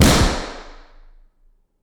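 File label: Lunch Room Center; Reverb; 29x37x12ft; 10ft; FL Studio 9; Fruity; Edison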